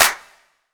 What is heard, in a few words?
Clap; rnb vibez